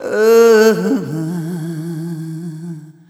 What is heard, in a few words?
Arrhythmic F